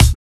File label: chip kick